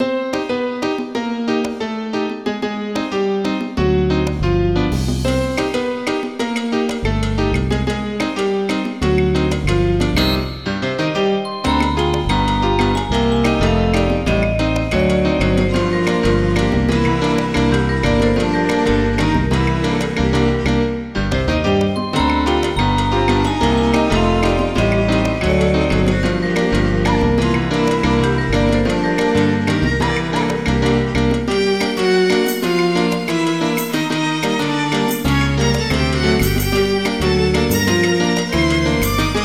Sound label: salsa; Especial Multimedia